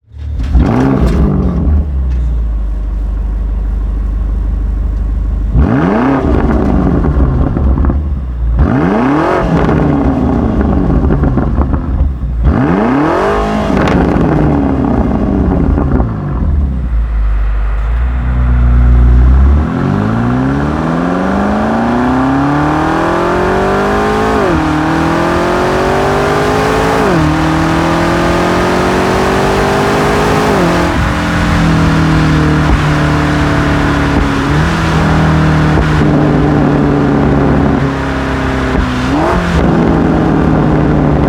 Slip-On BMW M5 G90

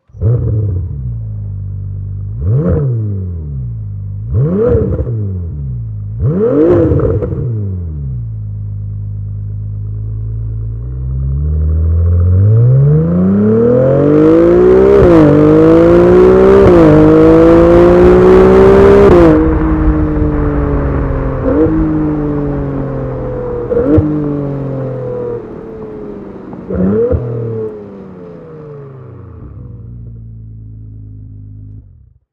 SLIP ON RACE LINE TITANIUM FERRARI 296 GTB

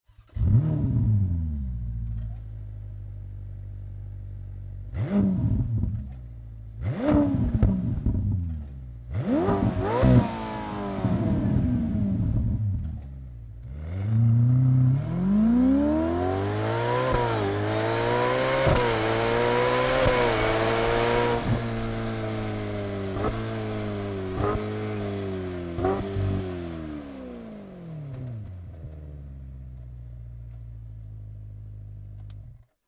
STOCK EXHAUST SYSTEM FERRARI F8 TRIBUTO

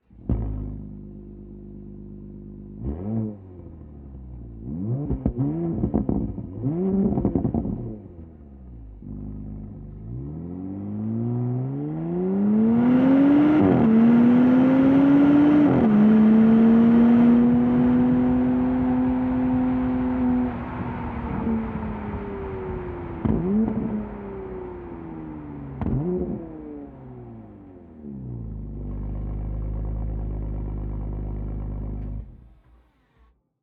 STOCK EXHAUST SYSTEM PORSCHE CAYENNE 536.2; hybrid se hybrid akrapovic evolution line titanium performance exhaust

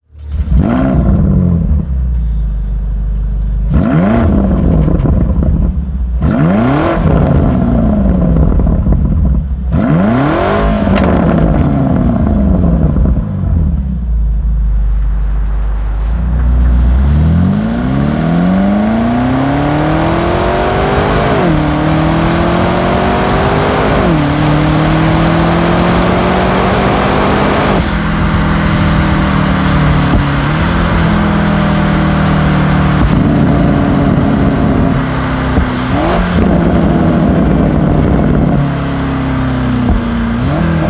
BMW M5 G90 akrapovic slip-on line titanium

